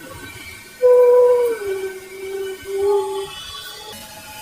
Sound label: Basement Jail - Female Hum; Middleboro Town Hall; WOMAN SINGING; VOICE ISOLATED